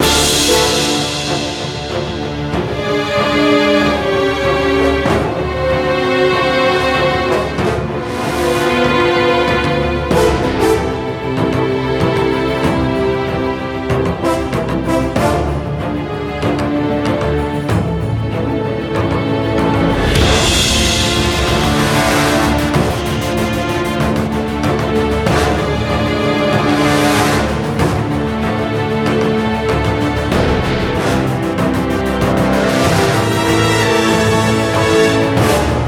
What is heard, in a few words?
bgm battle; witch spring 3 android ios mobile gamerip 2017